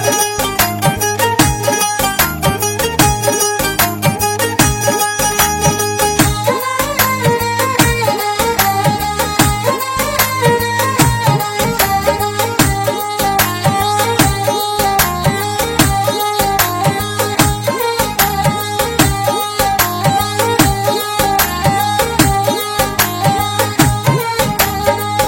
Sarangi Instrumental High Pitch

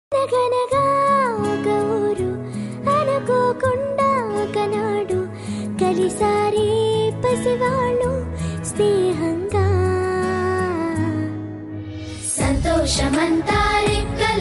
telugu love song latest tamil song

